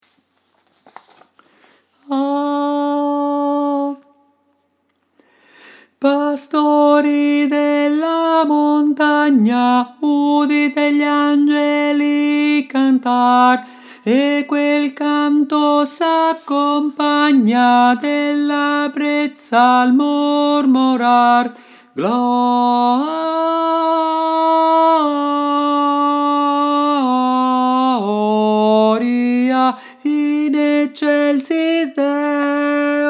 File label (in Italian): angeli nelle campagne tenori